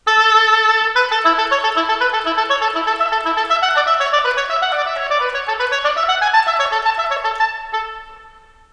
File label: oboe